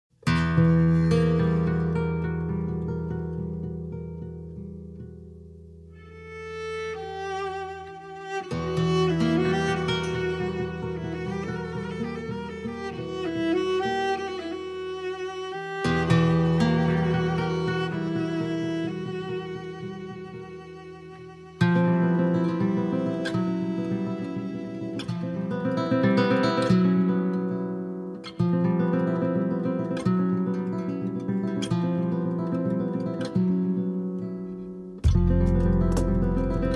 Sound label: Flamenco Fusion